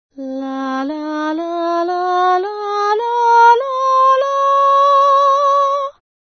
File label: Chest and Head Voices